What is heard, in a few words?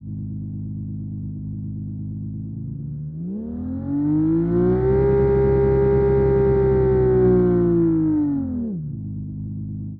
v6 japanese ext, engine sound